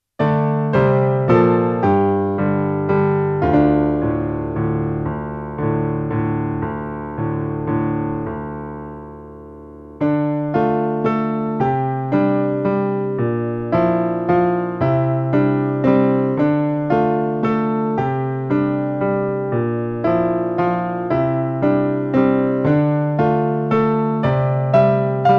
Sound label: piano